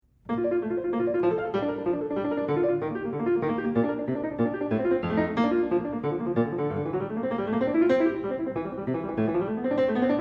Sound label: Prelude and Fugue No 21 in B-flat major, Clavier; Johann Sebastian Bach; Well-Tempered Clavier